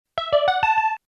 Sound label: Success